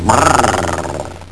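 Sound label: tiger growl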